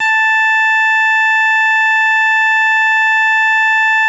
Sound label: BASS; Best Service ProSamples vol.55 - Retro Sampler; AKAI; E-BASS